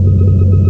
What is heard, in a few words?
slip loop, class 66 train; OpenBVE